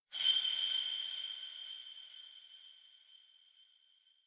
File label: Kagura Suzu